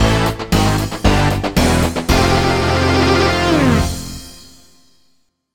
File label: you lose; K.O. Fighters